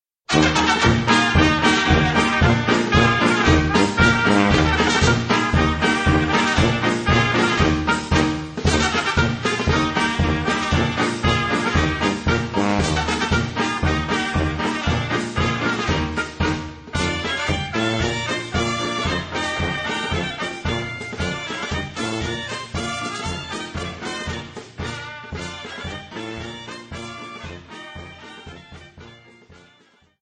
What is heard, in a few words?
musical clock polka